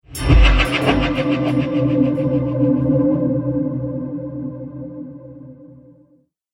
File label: Atlantis Trailer Hit; Trailer Sound Effects